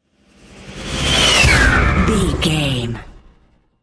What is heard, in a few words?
airy whoosh large